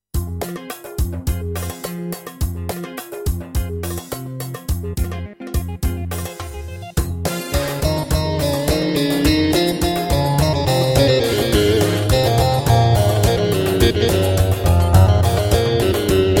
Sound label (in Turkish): elektro gw8, elektro baglama; son versiyon